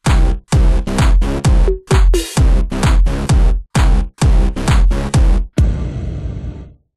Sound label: Dance Logo; HVS Dance Logo 720; Victorious Time to Shine; Xbox 360